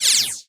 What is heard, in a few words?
Futuristic Weapons Sound Effects; Scificannon